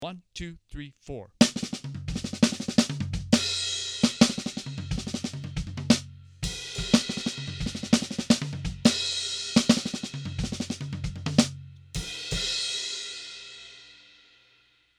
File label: Melody Kit